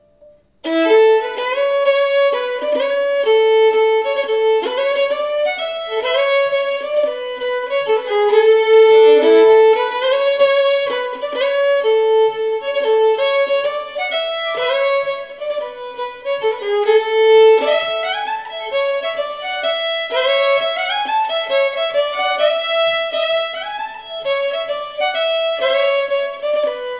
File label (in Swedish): polsk Engerdal; Johan Sletmoen